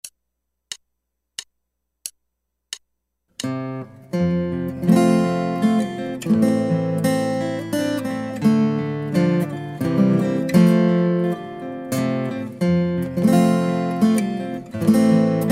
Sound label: Guitar